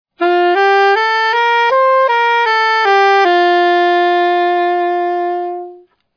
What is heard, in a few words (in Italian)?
scala legata, Sax